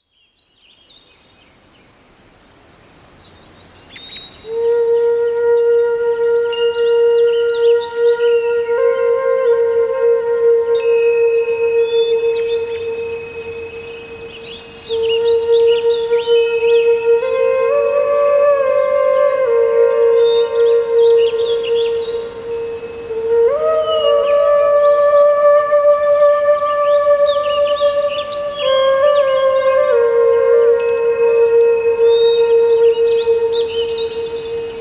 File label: Asian Zen Spa Music Meditation